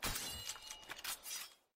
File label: Reload Short; Izanagi